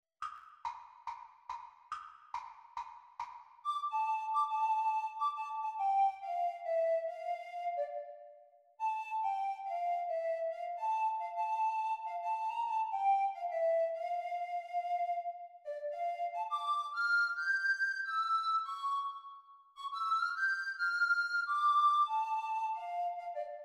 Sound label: Descant Recorder Practise speed